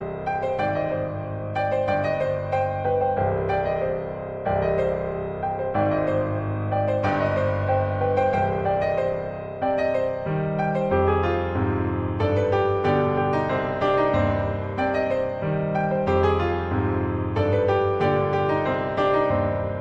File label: croatian piano; ringtone